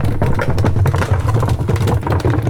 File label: Roll Wood Barrel Loop; SFX Kit; Foley - Misc